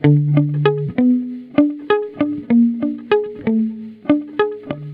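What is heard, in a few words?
riff abm 97bpm processed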